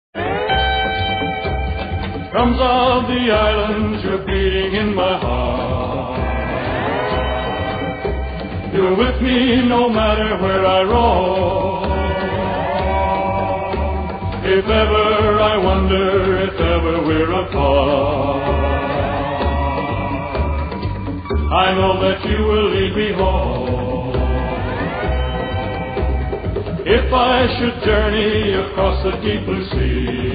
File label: Drums Of The Islands